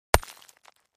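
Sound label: Brick Hit Sledge Hammer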